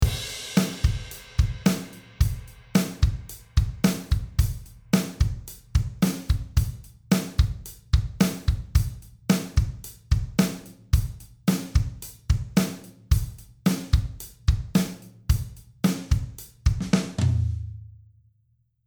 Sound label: altiverb 8 xl drums the tracking room nashville; audio ease